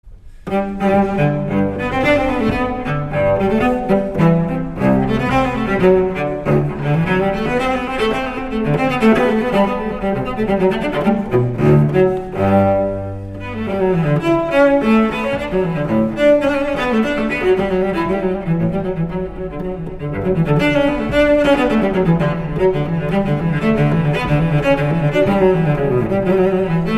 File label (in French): suite en sol majeur courante, cello; la suite num 1 per a cello de j s bach